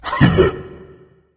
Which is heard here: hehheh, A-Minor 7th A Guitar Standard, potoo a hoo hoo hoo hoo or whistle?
hehheh